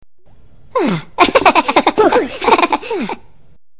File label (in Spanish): risa ahahaha; minion